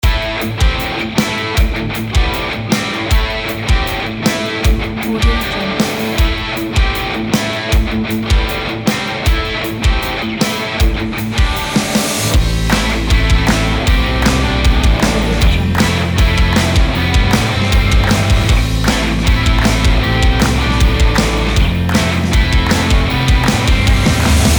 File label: rock music